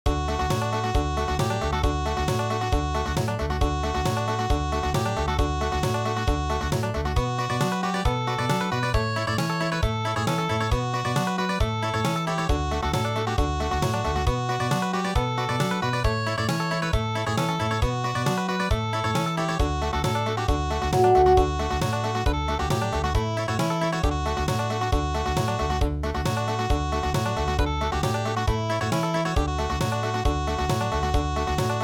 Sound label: Country